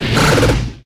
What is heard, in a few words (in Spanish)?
Grito; Quilava